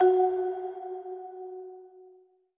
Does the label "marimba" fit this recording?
Yes